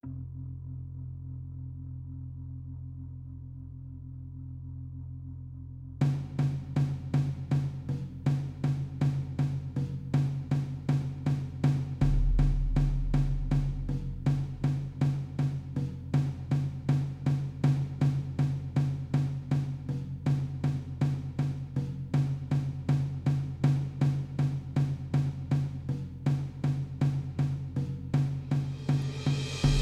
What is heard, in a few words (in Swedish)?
Kniven gitarrmix